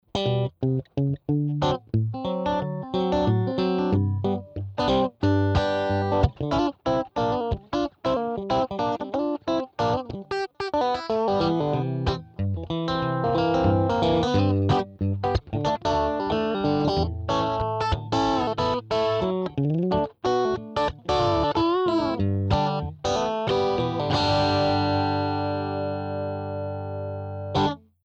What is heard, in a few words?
crunch, guitar; LLV-52 PRINCE TWD